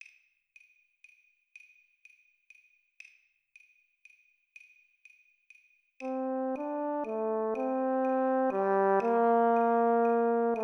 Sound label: Horn Play Along 40 BPM